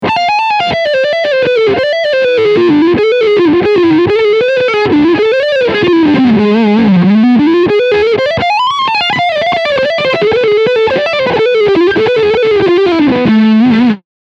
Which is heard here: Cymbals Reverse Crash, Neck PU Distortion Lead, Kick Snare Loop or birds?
Neck PU Distortion Lead